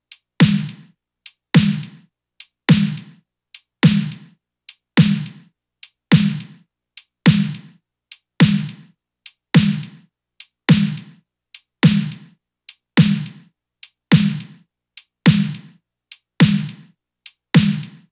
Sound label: top 105, Drum Loops; Slate; SD MIDNIGHT Sample Pack